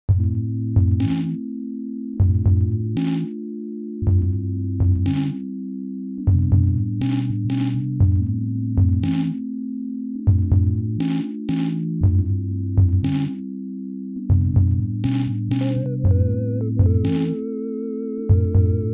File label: Sine wave